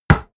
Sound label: hit wood quarterstaff medium